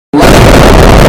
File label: loud vine boom